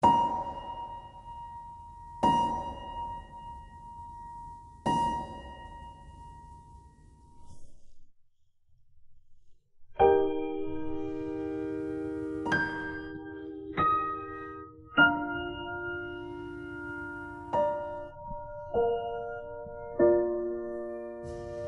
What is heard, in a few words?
fail sound